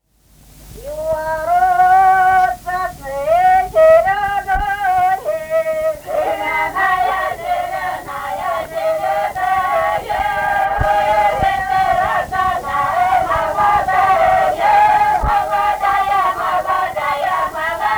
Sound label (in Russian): У ворот сосна зелёная (свадебная); Песочня